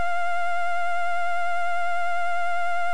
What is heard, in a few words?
Station; Seibu